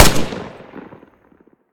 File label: smg end; immersive sounds; Factorio Paranoidal mod; cyclic